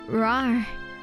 roar

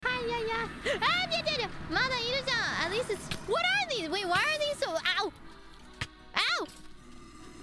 lots of noises

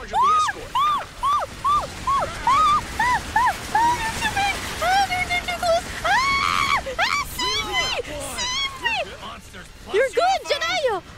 Screaming like a monke; IRyScream

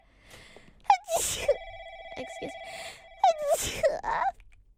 Sneeze

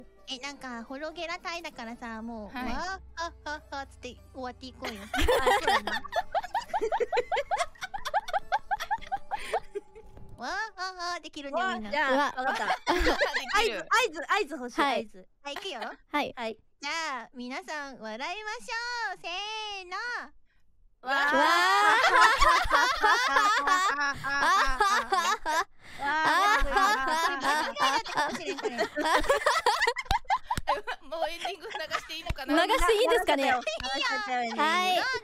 war cry; Hologera

